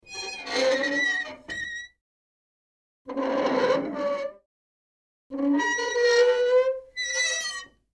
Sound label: Hinge Squeaks Three